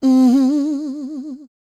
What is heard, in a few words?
MMM, Vocal Blues Soul; Spectrasonics; Planet